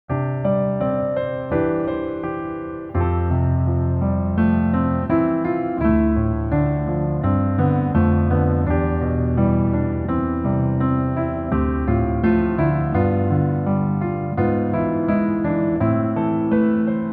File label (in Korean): Piano ver 멜로디MR